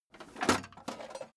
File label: metal plate armor handle